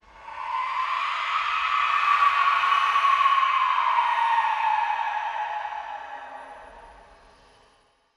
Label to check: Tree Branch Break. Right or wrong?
Wrong